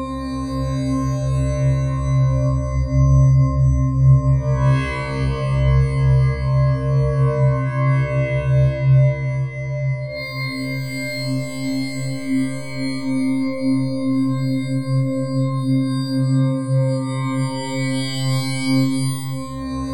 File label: WAVETABLE; Bolder Sounds - Granular